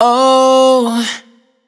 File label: adlib Oh wet, vocals; Slate Digital Omnivox Vocal Sample Pack; Adlibs